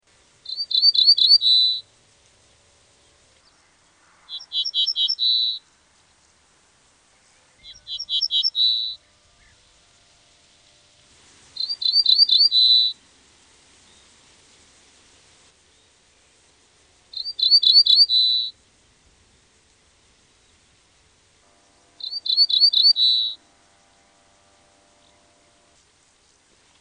Kerti sбrmбny; Madarak

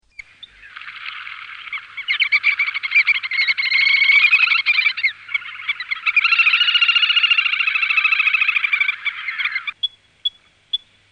Kis vцcsцk; Madarak

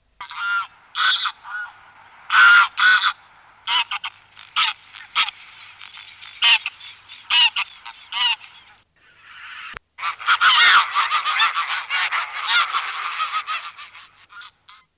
Nyбri lъd; Madarak

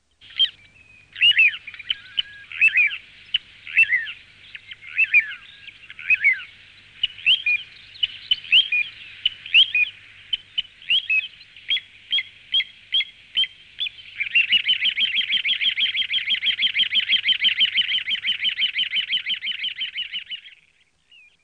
Kis lile; Madarak

